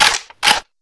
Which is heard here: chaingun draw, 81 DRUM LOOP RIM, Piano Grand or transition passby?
chaingun draw